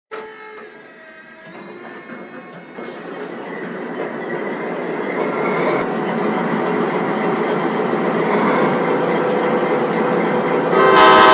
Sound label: Dash-9